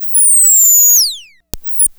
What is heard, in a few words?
Click and Whirl one shot, SFX; Sonic Foundry (Sony Creative Software); Electronic Point Blank